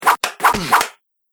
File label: Fight Swish Strikes With Body Blow; Punch And Hit Sound Effects; Andrew Fleming